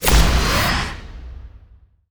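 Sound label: attack boost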